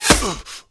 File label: hit ultra; cstrike